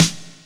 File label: prominent steel snare drum sound f key